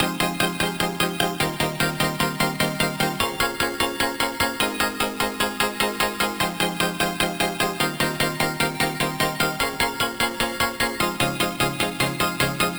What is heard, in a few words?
Future Bass Chord Loop - 150 BPM F Min, synths